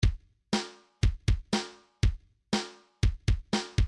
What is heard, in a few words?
rock kick snare 2 bar